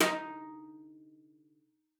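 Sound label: CELLO spiccato D#4; 90 s Sample CDs; Xsample VOL-5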